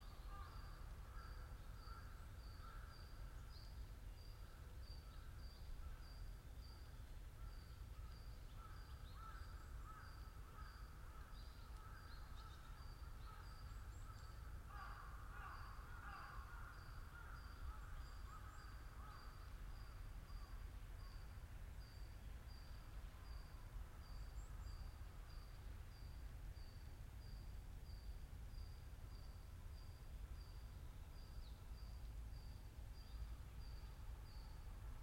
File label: yamanakako sound